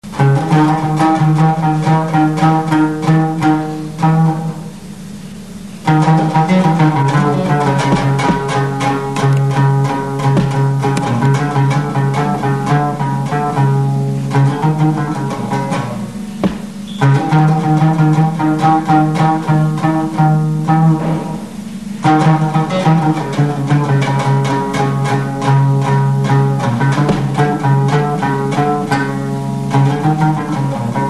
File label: barbat